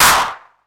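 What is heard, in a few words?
808 clap; PERC TR; Wizoo - Platinum 24 Electronic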